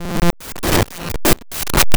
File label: Rhythm